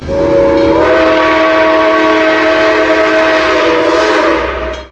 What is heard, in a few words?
horn